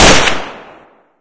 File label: five seven; Source Engine; cstrike; weapons